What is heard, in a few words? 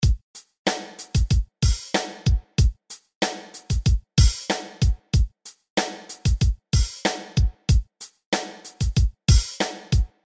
No Compression